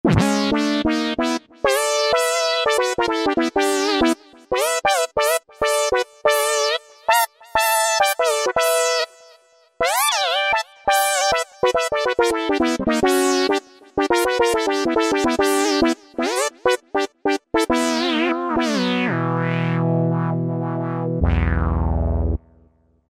Funky Saw, Roland V-Synth; Factory Patches

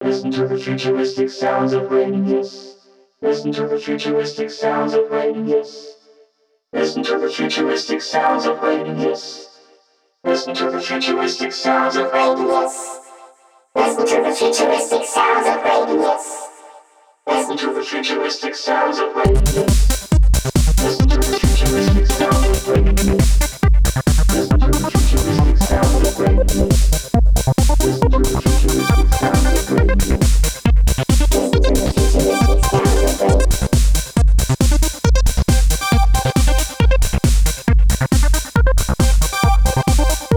Tech Morph, Korg Radias-R

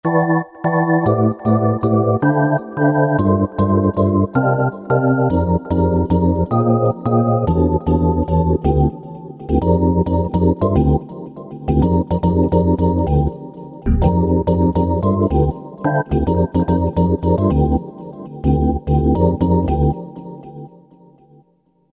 dance vibes; Roland SRX-05 Supreme Dance

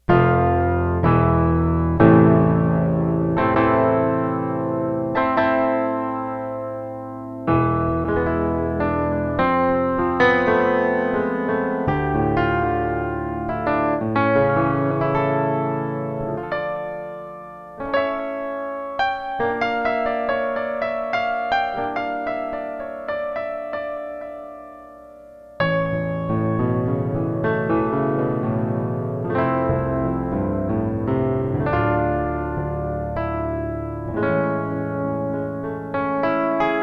acoustic piano

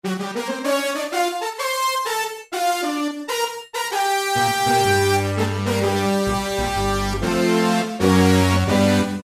Westerly Brass, Roland PN-D50-03

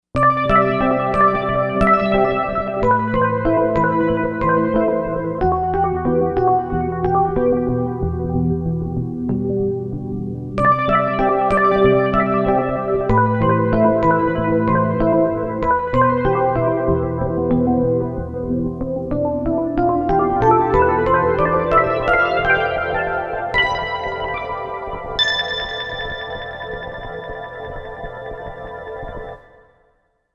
Wavesweep, Korg M1Rex; Factory Patches

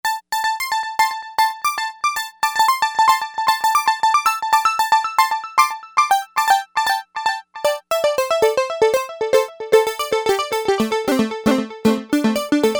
Polite Pluck

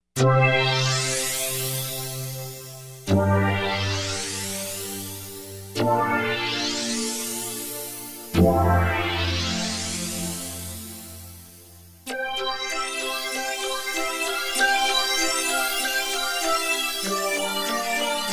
Digital Polysix, Korg TR-Rack

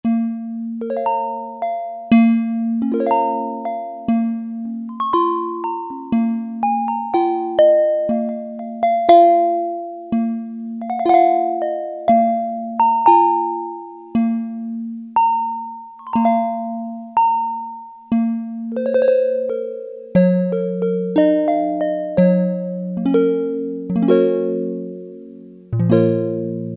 LUTE, Yamaha DX7

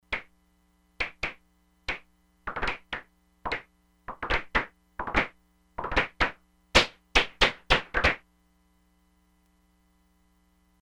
NOISE CLAPS, Crumar Bit 99; Factory Programs

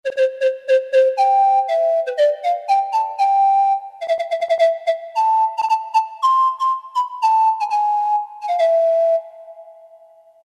Pan Pipes, Roland MT-32

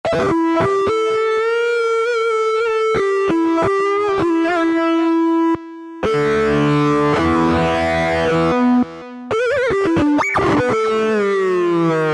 Cryin' Dist, Roland V-Synth; Factory Patches

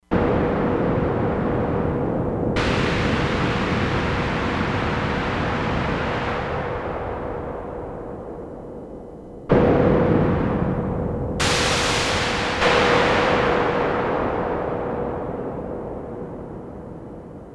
EXPLOSION, Crumar Bit 99; Factory Programs

